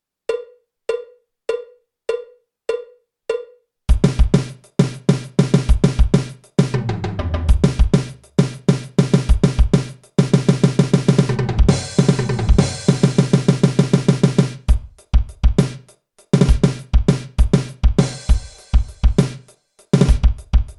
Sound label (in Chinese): 架子鼓独奏 八六拍; 66条架子鼓独奏SOLO